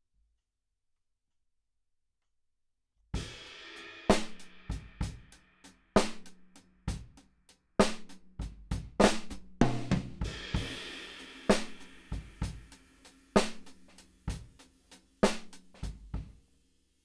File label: Kit